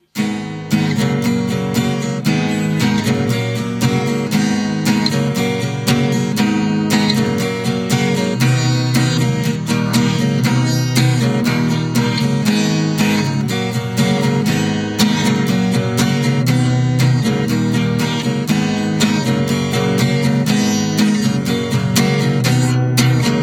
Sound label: guitar; STALKER